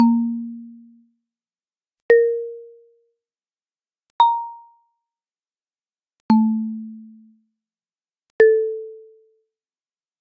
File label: key, instruments; fischinger